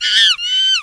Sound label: Monkey Cry Sms